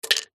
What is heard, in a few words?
household pill tablet sinlge drop into plastic pot on others; zapsplat